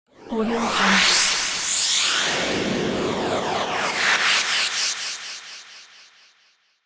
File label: noise phaser fx